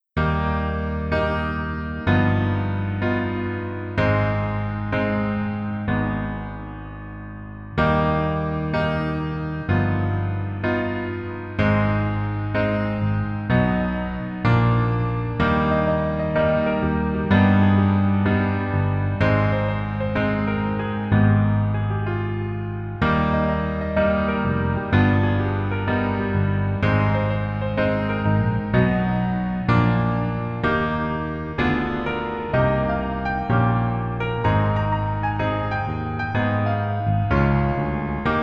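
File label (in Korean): Piano version 멜로디 MR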